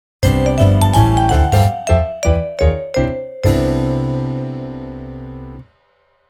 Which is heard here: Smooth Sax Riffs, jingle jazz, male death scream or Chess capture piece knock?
jingle jazz